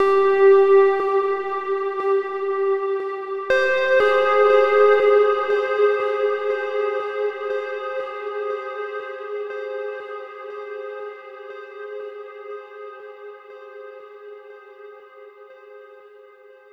Overlay Tone; Act3 Rezoning; Mirror's Edge Catalyst

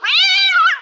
Hit Cat; Toy Story Mania

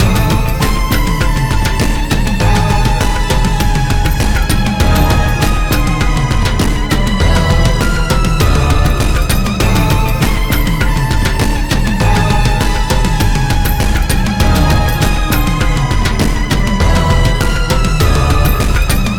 Boss Falling Loop; Roah; Switch Windows

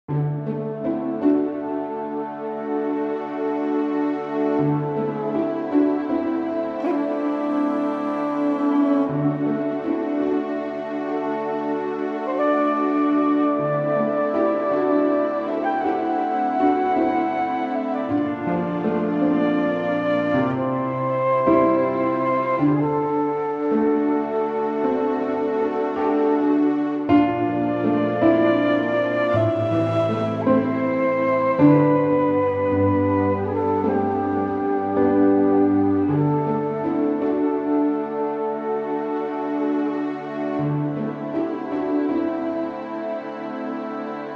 Lobby Music; Town of Salem 2

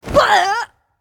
Ninja Hit; Character Ninja Hit

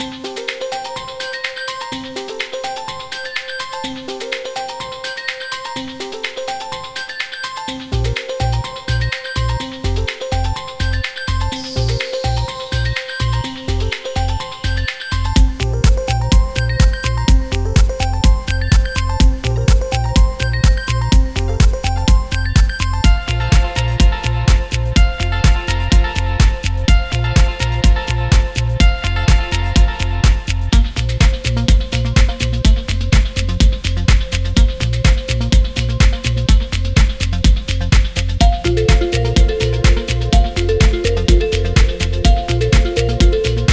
Music Observation Briefing; Smart As...; PS Vita

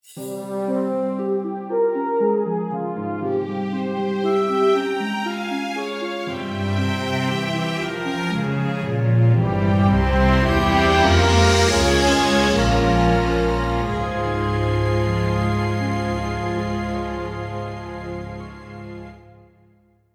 Field Jingle

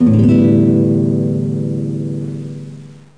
guitar

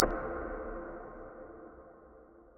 Clock tick

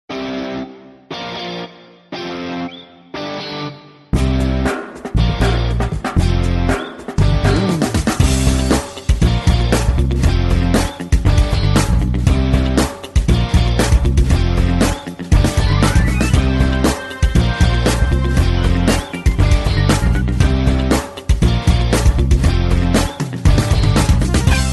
Menu; Istunt2 Menu

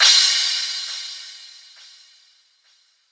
Retry Jingle; Friday Night Funkin G-Sides

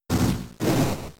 Ember; Pokémon SFX Gen 3 Attack Moves; RSE FR LG

